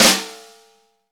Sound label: SNR, Snare; Club-50 - Foundations Roland; Ext.Snare 4